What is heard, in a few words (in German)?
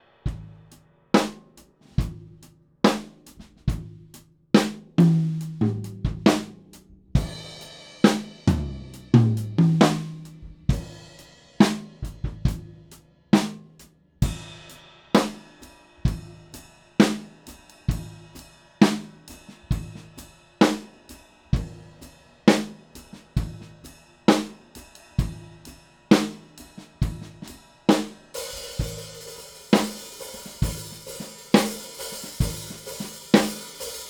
Presonus DM 7 Schlagzeug Mikrofone